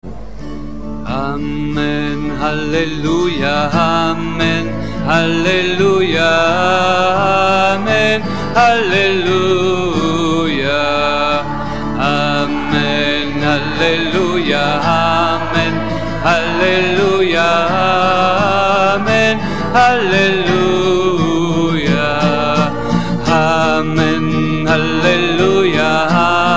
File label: amen alleluia